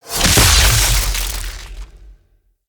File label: Kuva Lich Requiem Mod Fail B, UI